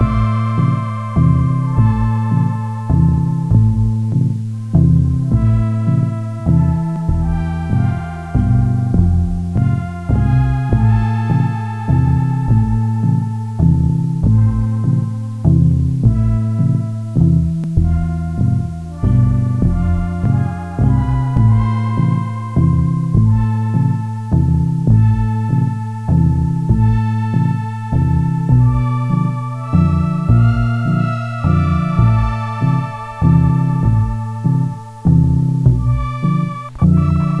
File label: ghost train; zps; music